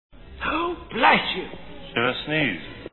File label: sneeze